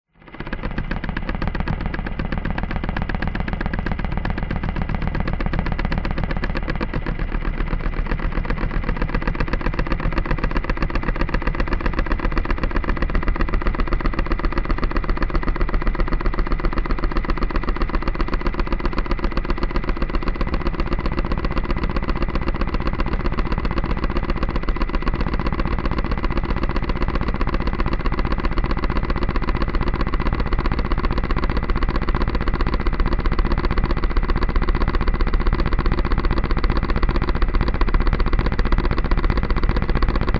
industrial engine motor generator or similar chugging could be motorboat; zapsplat